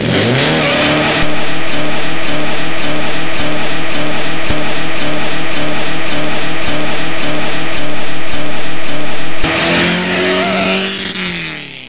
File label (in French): TRACTEUR; Vache